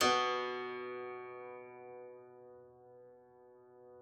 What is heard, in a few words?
klavicymb H2; Xsample VOL-8